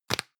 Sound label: plastic can lid screw close hard; objekte; dose